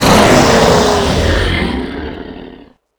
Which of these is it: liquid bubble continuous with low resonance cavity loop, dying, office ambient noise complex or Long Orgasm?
dying